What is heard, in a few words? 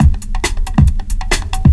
LOOP FX, Big Beat Drumloops